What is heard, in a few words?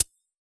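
hi-hat MS Closed, Yamaha DJX; 155 DJX Kit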